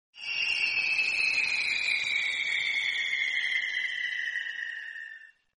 bomb whistle

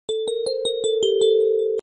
cute sms ringtone notification tone

